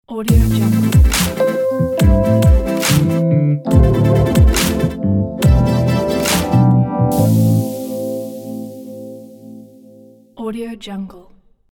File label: hip hop chill funky logo